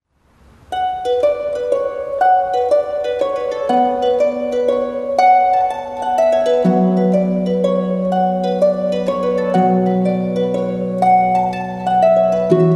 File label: butterfly jig traditional